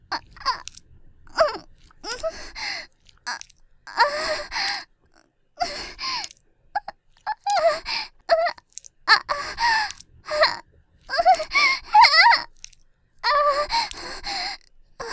喘ぎループ（効果音付き）; 即オナサポート

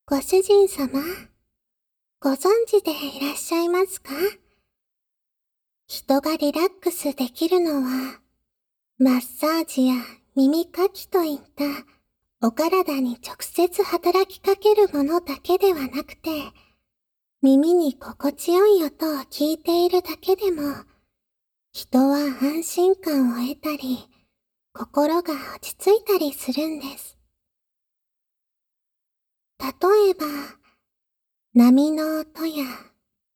包みこみ心音癒し

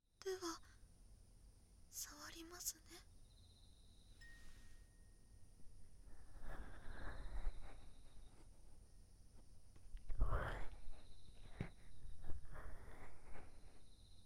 左耳マッサージ, ASMR; お隣さん夏

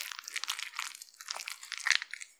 挿入or引き抜かれ終わる直前 膣内射精後or興奮時; 挿入or引き抜く

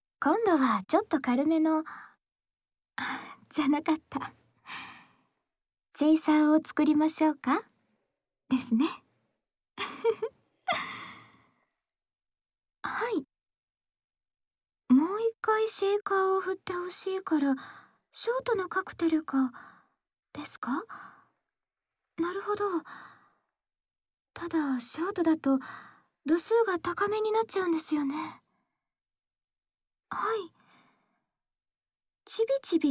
シェイカー・氷ASMR・甘い言葉; チェイサーは軽やかに

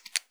深めにストローク, ピストン運動 激しめ; １ストローク単発

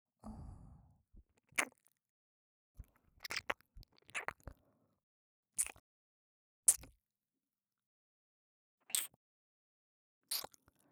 ちゅっちゅっ 右

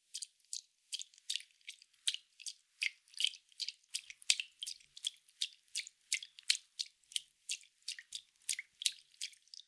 ぴゅっ, 水音効果音

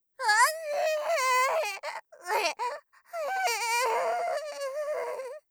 あへぇ; 効果音なし

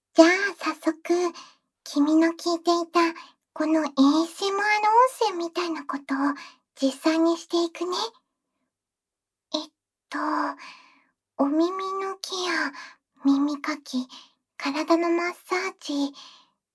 お耳 マッサージ; 早速お耳マッサージしちゃいますよ

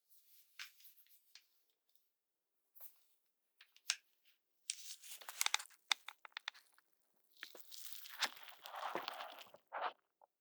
ヘッドホン装着

